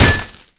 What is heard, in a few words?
bottle intact hit world; tf